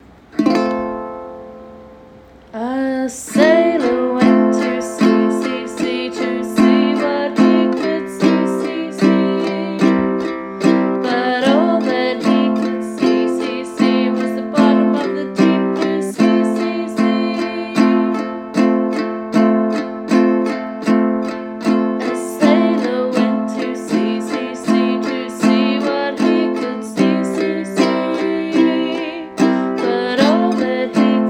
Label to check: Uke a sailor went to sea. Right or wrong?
Right